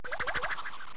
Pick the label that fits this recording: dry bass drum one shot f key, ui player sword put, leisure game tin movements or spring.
spring